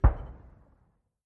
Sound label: 155mm Howitzer: Shell Explosion, Distant. Dual